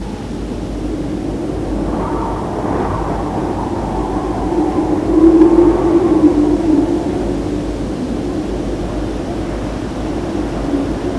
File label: wind cycle; ze space